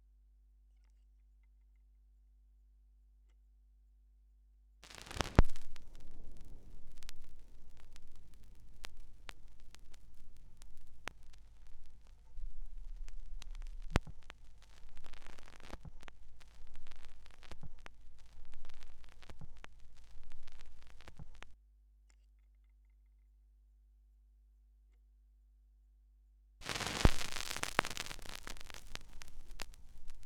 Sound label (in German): schallplattengeraeusche